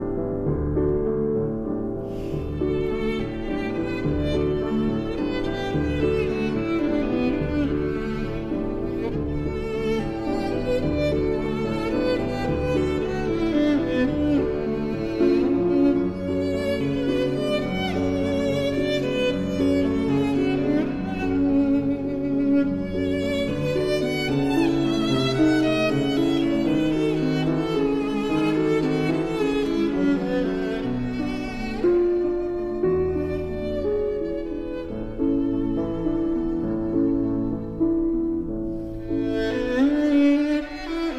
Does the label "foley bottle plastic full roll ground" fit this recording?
No